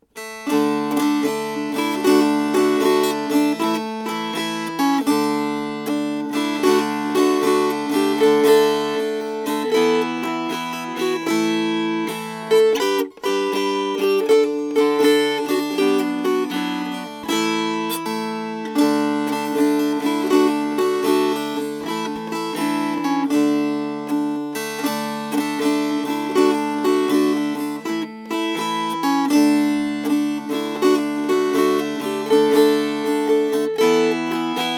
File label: Strummed; Dunderbeck